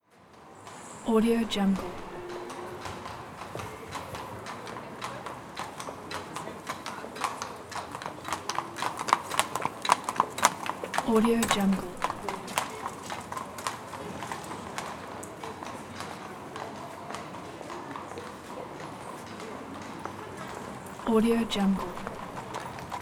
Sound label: horse and carriage in the city